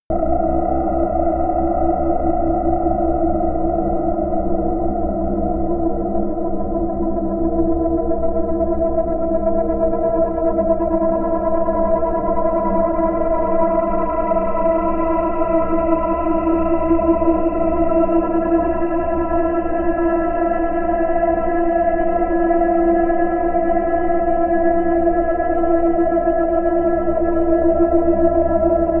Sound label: drone scorch high